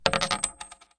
Coin Drop

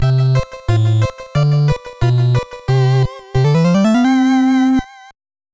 Rest - Eat; Esper Dream 2; nes

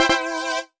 Jingle Microgame Clear; WarioWare: Smooth Moves

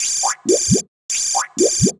Gear got mail; Zenonzard

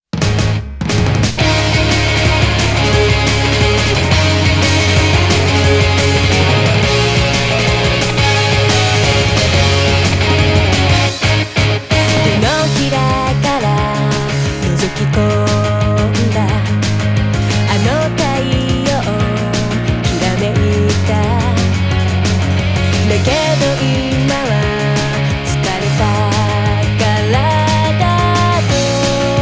Victory!; Guitar Freaks XG2 & DrumMania XG2

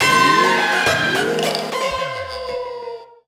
Vs Lose

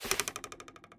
Bow hit; Blockman Go; Android

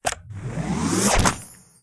Lollipop Hit

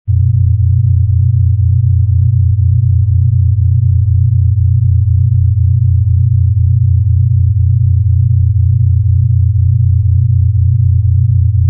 Ambient Lab

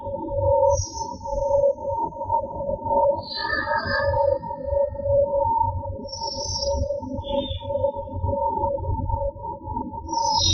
AMB SCI-FI LOOP; gamerip; strike team gladius; ps4 ps5 switch windows xbox one

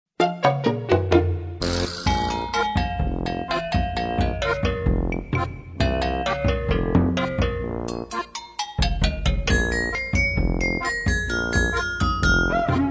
Bullfrog Groove; Tadpole Treble

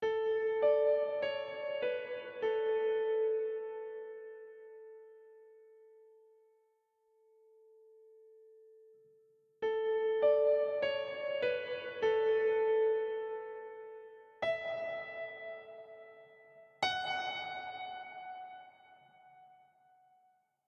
Fill; nova 111; ps vita

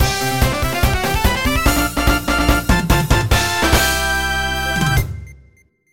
Time Trial New Record Jingle; Grand Dad Mania Revived

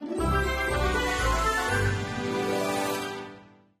Stinger Win; Pet Rescue Saga; Android

